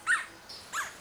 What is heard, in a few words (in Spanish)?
canto carpy, Carpintero de Puerto Rico